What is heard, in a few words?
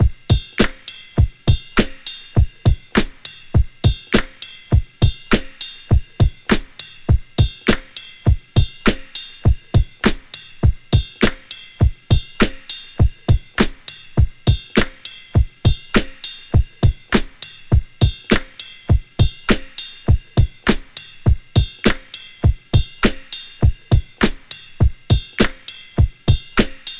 100.7 Clappy Ride, Breaks; Lockdown Breaks